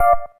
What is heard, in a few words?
gayageum, keytone